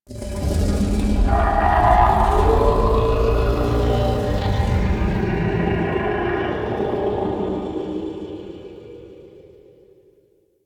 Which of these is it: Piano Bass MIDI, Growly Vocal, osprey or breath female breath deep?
Growly Vocal